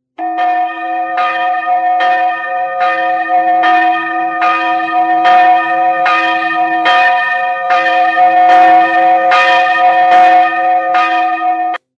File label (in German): Glocke Frauenkirche